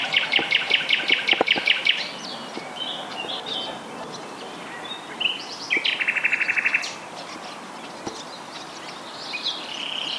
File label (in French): rossignol philomele